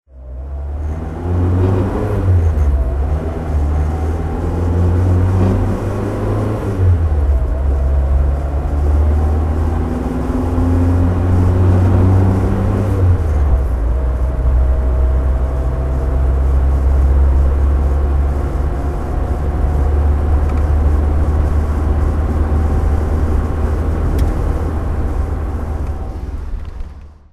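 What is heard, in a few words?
mercedes 190sl driving, engine sound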